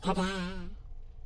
tada; wall-e

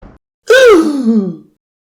gasp

